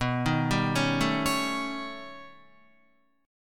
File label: B Augmented 9th B Guitar, chord; 6,6,7,6,9,9; arpeggiated; JGuitar